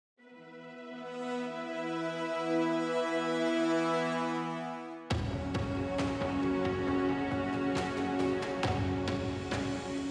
ending Music; fantasy strike; Midori

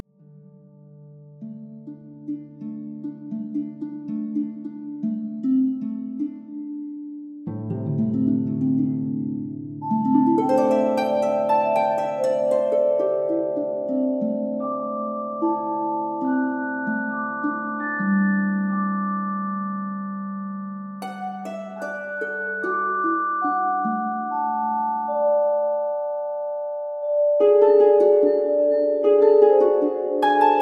Camp Harp D

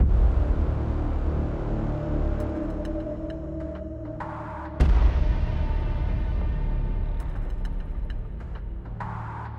Mood Tension Light

